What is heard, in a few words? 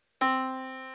Yamaha Disk, piano sound